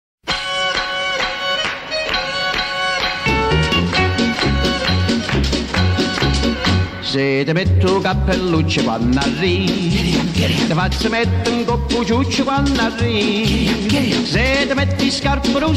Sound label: Cowboy Song Italian Ringtone